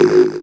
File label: synth, Dance Synths Trancers